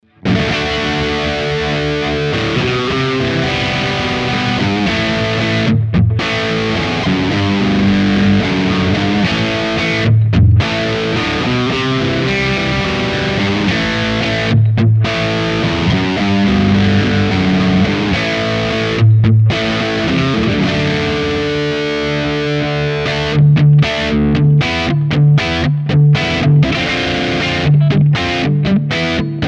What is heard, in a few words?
Les Paul Midgain Rhythm Neck Bridge Pickup; Slash Signature